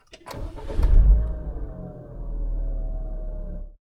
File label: Ignition